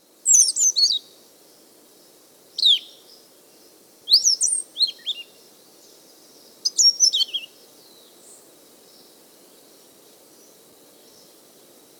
Pale-Eyed Thrush (Platycichla Leucops), Andean Birds; S. America